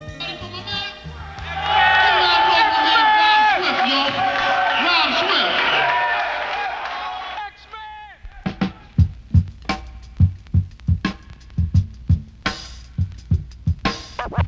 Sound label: Itchy Vibes And Drums